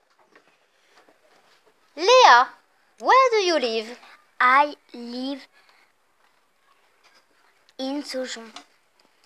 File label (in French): vocal